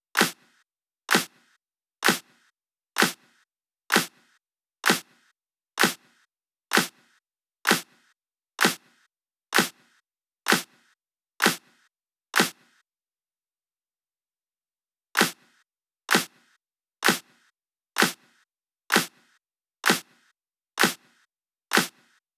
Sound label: 128BPM Clap single Pre; Essential Clubsounds Vol.5; Break the Chains; Root G#min; Drums & Perc